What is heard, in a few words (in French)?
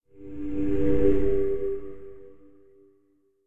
Fleur Lumineuse; Puddle Plus